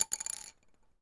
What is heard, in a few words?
pencil roll